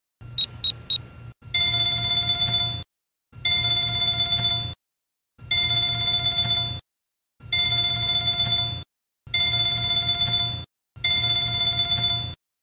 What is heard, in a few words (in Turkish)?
Zilsei; Polat